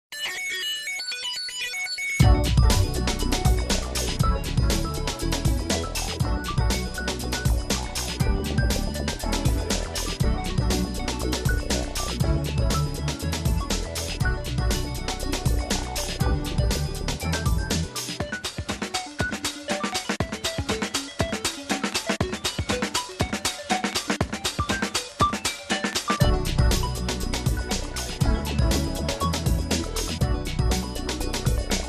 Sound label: Advert Music; Disney Channel